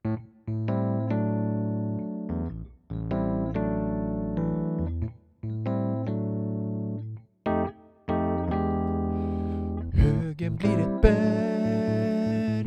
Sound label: Tenor